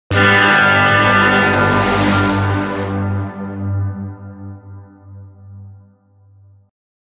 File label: Goal Scored Jingle; Metroid Prime Blast Ball; 3DS